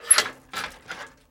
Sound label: Menu Mechanical